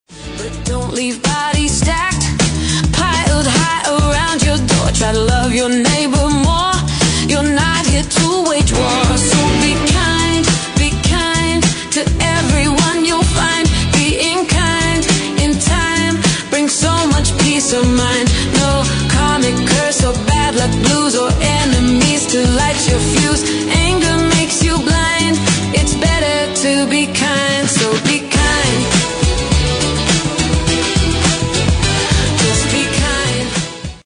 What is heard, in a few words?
EDM Dance beat; Gwana Funk